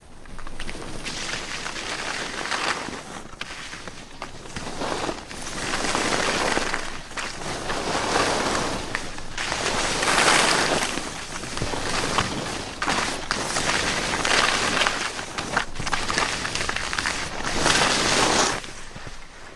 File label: snow skiing rougher slalom groomed runs harder surface handheld; sonniss gdc